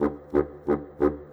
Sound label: Bassoon, Orchestral; Sonic Foundry (Sony Creative Software); Orchestral VOL-2; Modern 07